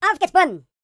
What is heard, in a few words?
german, YODEL YELL; ILIO; Vocal Planet